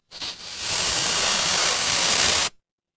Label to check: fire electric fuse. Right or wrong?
Right